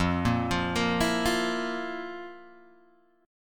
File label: F Major 7th Suspended 4th, Guitar, Open G